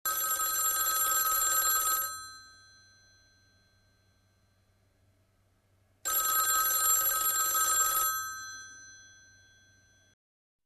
telefono sonando

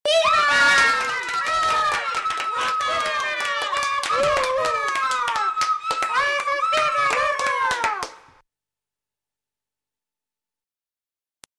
aplausos de crios

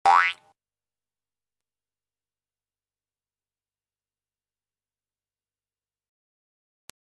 otro muelle

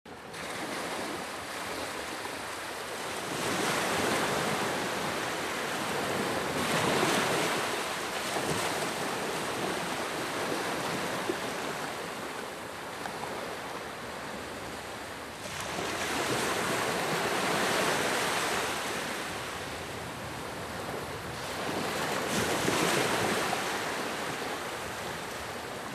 lluvia

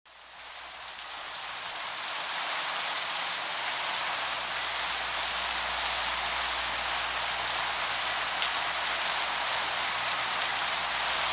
lluvia debil

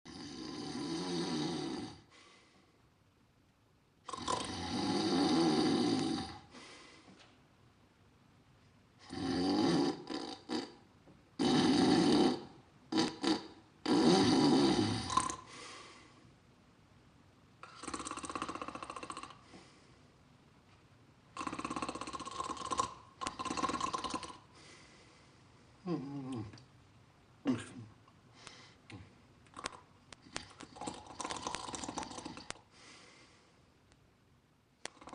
ronquidos

